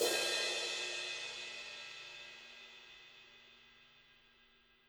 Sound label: Crash Dry Session Soft, Cymbal; One Shots; Drums; Ableton Live 12 Lite; Core Library